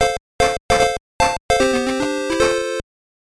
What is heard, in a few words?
jingle; kuni chan no game tengoku game gear